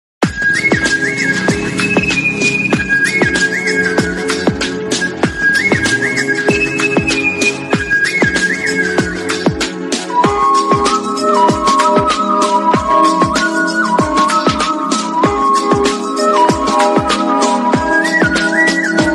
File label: love bgm telugu bgm melody bgm; polladhavan; sreeleela